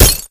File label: bullet light ballistic solid glass close; Titanfall 2